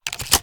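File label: reload empty start underload, shotgun; reloads; iw8; romeo870; gmod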